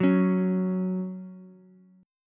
F sharp 5th, Ukulele; strummed; x,x,2,4; JGuitar

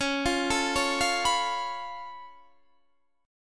Db Augmented 7th Db down Guitar Standard; arpeggiated; JGuitar

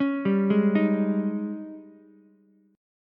Ab Major 7th Suspended 4th Ab down Ukulele; AbM7sus4; arpeggiated

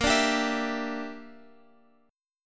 Bb 6th Flat 5th Bb, Guitar Open F; Bb6b5 strummed

